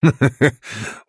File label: laugh; mccree